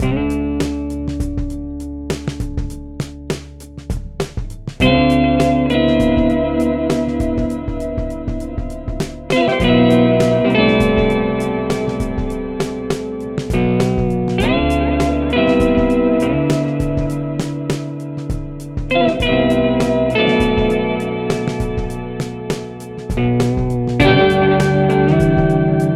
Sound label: TC Electronic Plethora